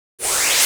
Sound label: Antimatter Mole Prime Cast; Warframe